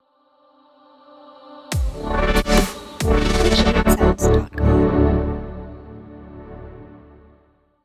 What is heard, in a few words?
Dub